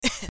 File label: Pain; Assemblee SFX